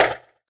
step Player, marching Orders; HeavenStudioPlus

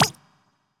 flip; HeavenStudioPlus; flipperFlop

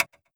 metronome left; HeavenStudioPlus; mrUpbeat

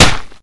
somen drop; HeavenStudioPlus; rhythmSomen

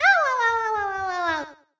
burning; sm64coopdx; char-select-extra-chars; toadette

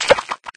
somen splash; HeavenStudioPlus; rhythmSomen

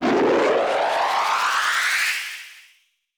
jet Speed Up; HeavenStudioPlus; spaceSoccer

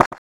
book horizontal; HeavenStudioPlus; cheer Readers

